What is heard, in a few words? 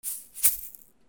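percussion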